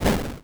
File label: burn; Adventures in Lestoria